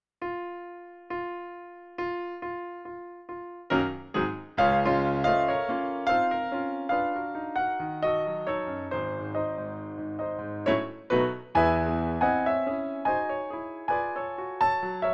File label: piano; Brahms